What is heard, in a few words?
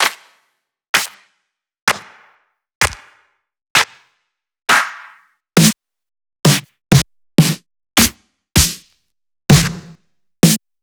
CLAPS, SNARES & COMBOS